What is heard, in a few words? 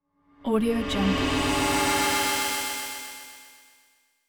whoosh energy swell; whoosh effect; hollywoodedge